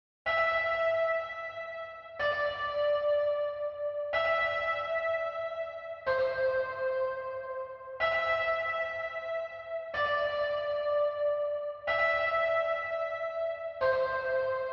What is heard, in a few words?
124 BPM C Maj Keys; Melody; Loop Stems; Cymatics; 2022 Melody Collection Platinum Expansion; Peach Tea